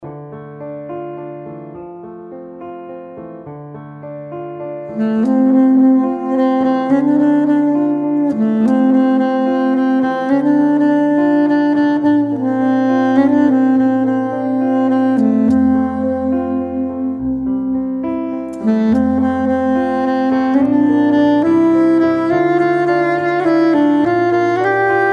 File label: sax sopr. piano